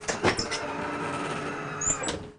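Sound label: front door open, volvo 9900; Freyfurt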